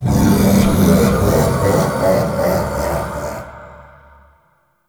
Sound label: Laugh; Warframe; Entrati Doppelganger